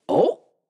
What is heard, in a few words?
reaction oo; goldentavern